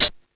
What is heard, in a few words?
METAL, ELEC PRCS; East West; Global Sounds